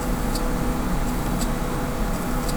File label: gpg mic blocked